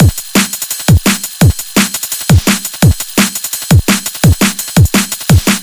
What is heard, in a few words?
Fresh Beat, 170 bpm